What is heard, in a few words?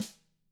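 DW HIT, drums, snare; ILIO; Double Platinum Drums 1; DW SNR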